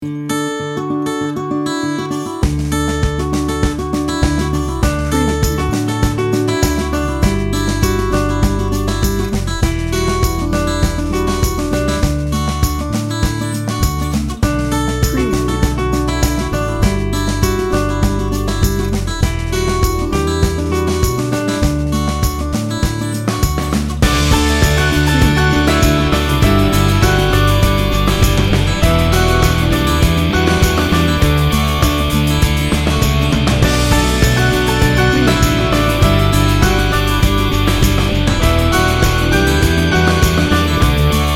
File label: americana morning